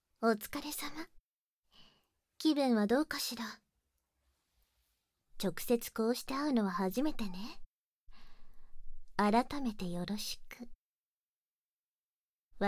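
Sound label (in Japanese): 片耳舐め（バイノーラル 耳舐め・囁き強化編集）, ASMR; 片耳舐めオーガズム プログラム開始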